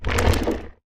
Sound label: attack, creaking; minecraft; mob